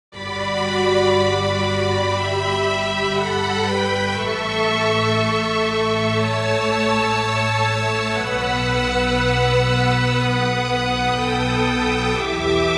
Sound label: Strings Reverb On